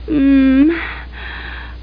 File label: MOAN